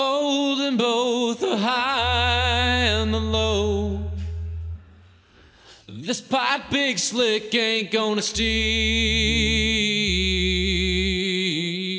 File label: vocal; sgmse